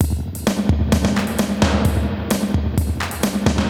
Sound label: Spring Verb HR-16B (130BPM), cyborg beats